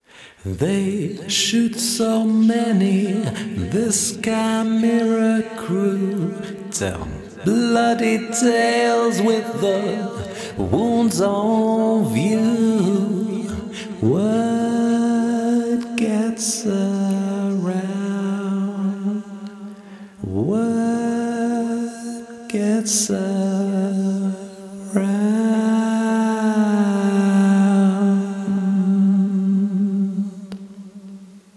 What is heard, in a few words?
Vocal Chorus